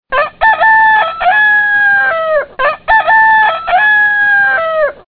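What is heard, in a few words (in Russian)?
повторяющийся крик петуха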